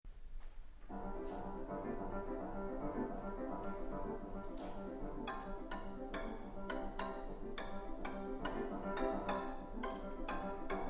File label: Jamaican Rhumba, viola